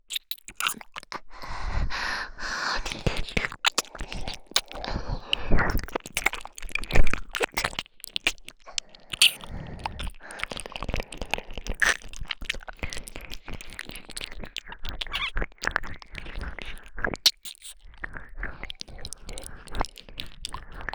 耳舐めのみ(両耳ver）; 癒らしママ

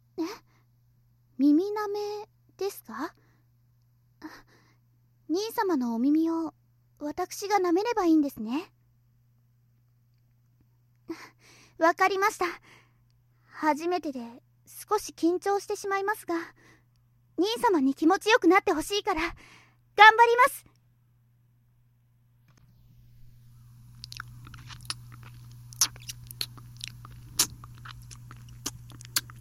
耳舐め 右耳

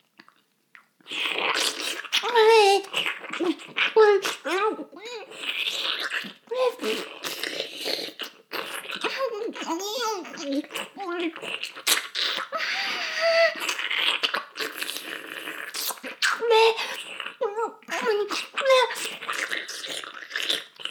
耳舐め音声; 牧場戦腺; 少佐殿

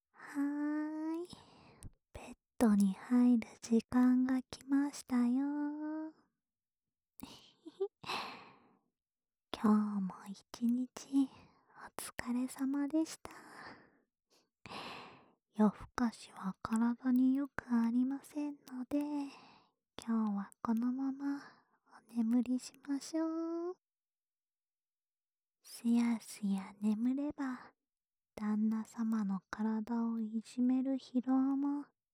吐息いっぱいの添い寝（左耳）, ASMR; 竜胆と吐息いっぱいの添い寝

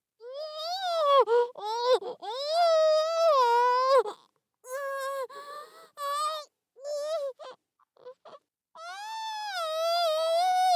焼き窯; 効果音なし

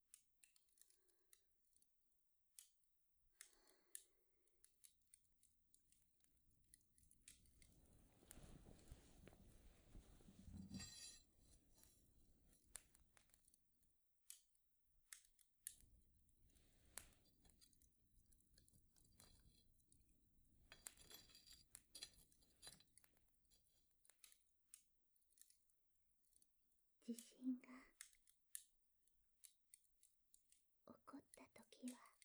囲炉裏ぽすぽす; 囲炉裏SP

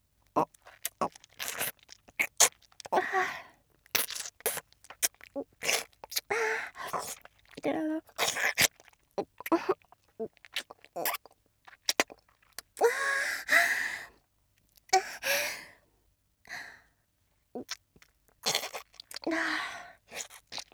ちゅぱ音（キス・フェラ系）, ASMR; ＳＥなし

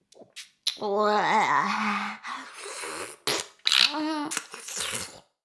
耳舐め音声(LR逆ver); 牧場戦腺; 少尉

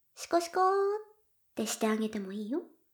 しこしこ音声, ASMR; 援交JK魔法少女マスカリチェリー

